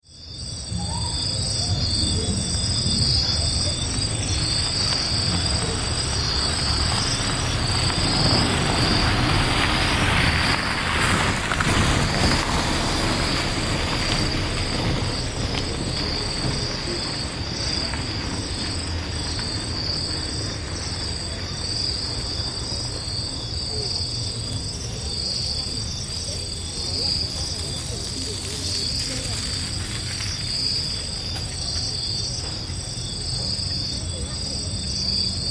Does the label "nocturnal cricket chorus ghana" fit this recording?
Yes